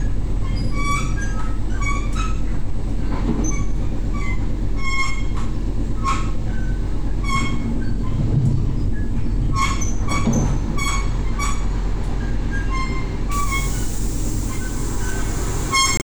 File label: foley